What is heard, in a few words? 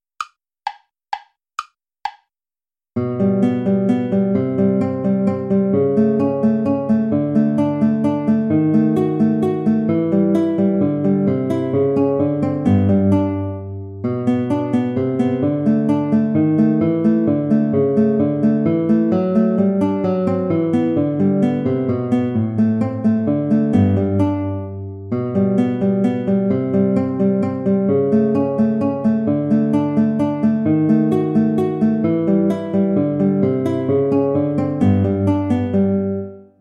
wish you merry christmas play along; traditional